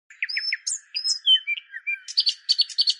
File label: birds sound